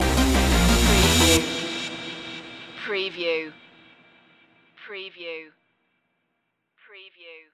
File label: massive stinger